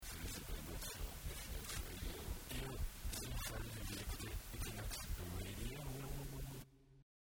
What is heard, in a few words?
jingle